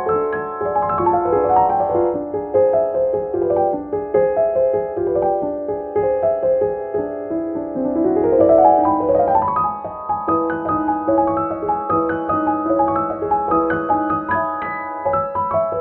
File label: Ravel Jeux D Eau rightHand Reference